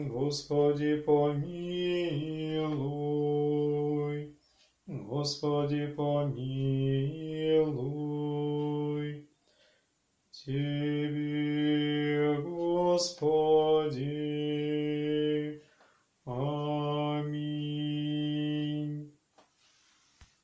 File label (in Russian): Малая ектиния византийский